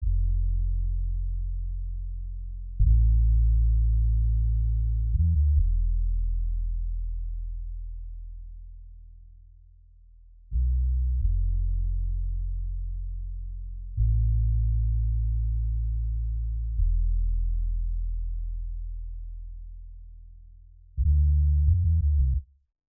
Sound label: Bass, 86 BPM A Min; Melody; Infinity Melody Collection (Preview); Cymatics